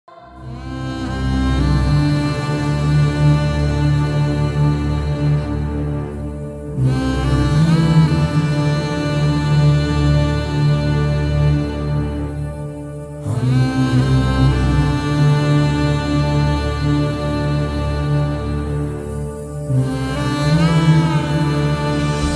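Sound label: death scene background music emotional; ramcharan; acharya movie; chiranjeevi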